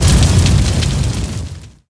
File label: sparks